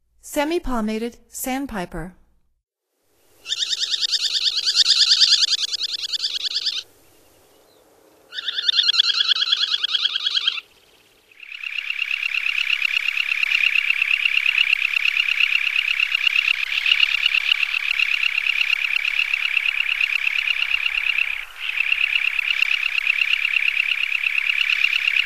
Semipalmated Sandpiper, Songs of Birds of North America; Stokes